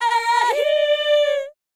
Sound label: Fem Vocal 128bpm G FX, African Voices